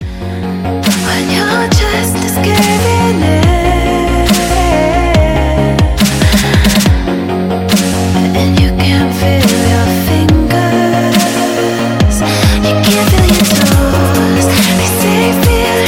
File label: ringtone melodic dubstep